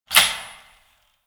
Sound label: door lock